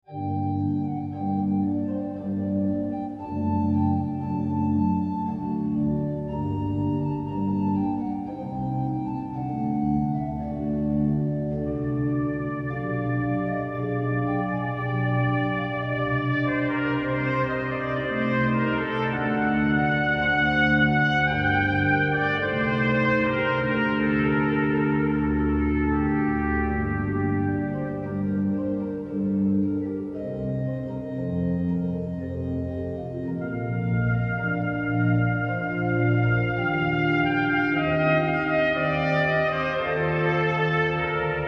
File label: Adagio, organ trumpet; Albinoni